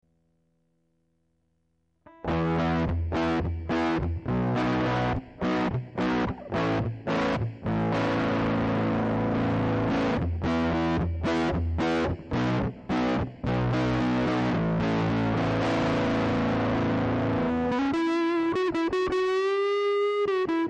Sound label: F Fuzz